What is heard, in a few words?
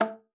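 A3, bass flute; Xsample VOL-2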